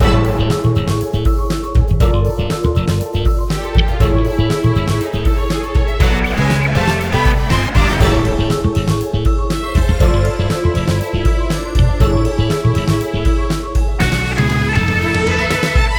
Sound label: Victory loop part